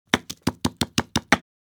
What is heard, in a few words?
Fast chopping knife cutting board